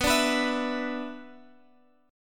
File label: B-Minor Double Flat 5th, Guitar Open G